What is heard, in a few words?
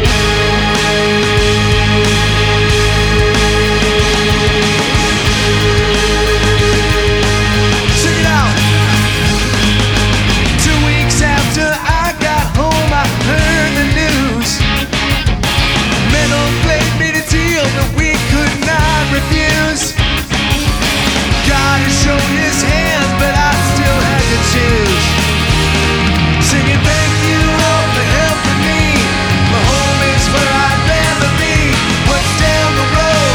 Blues